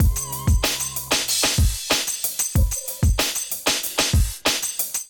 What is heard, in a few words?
Drums Kit; Ableton; Factory Packs; Chop and Swing